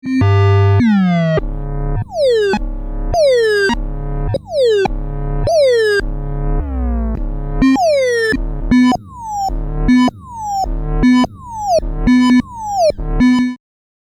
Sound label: Moog Modular